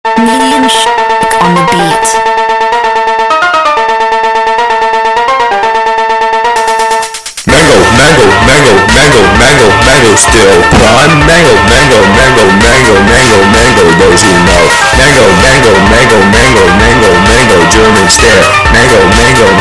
Phonk clean

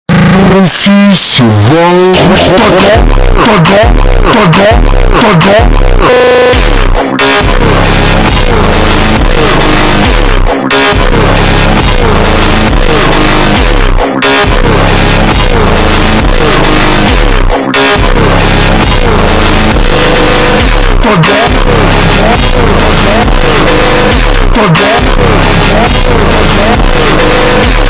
Funk ultra Slowed

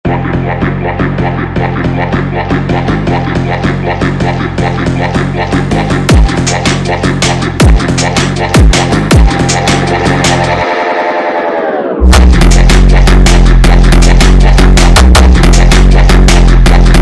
Bomba Funk sped Up Brazilian Phonk

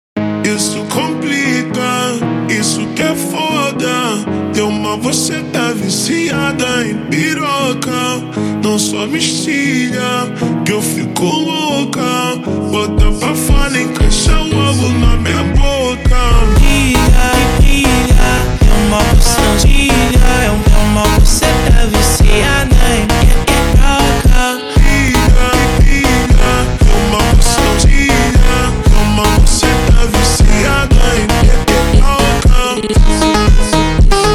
Montagem slowed

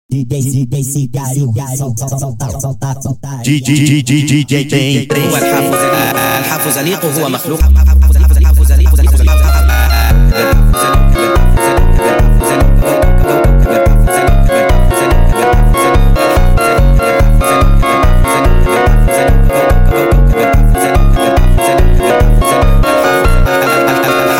Funk sped Up

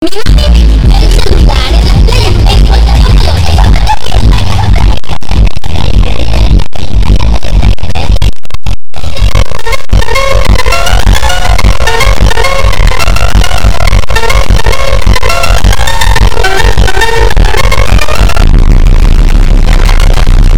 funk superbass reverb slow

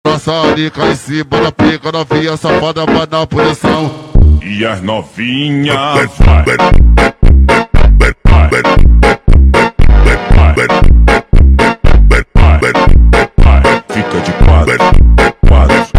Montagem slowed

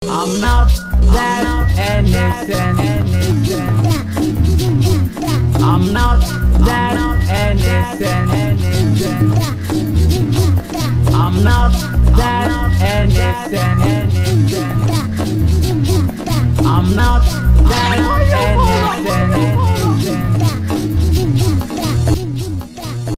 VOCALOID superbass reverb slow

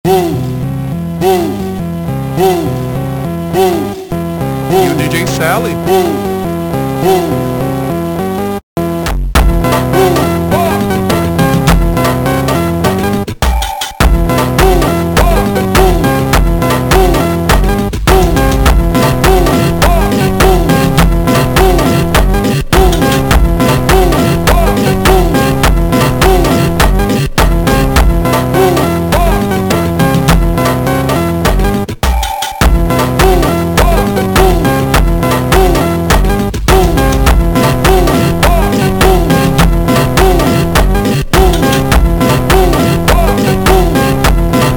Creepy Funk slowed

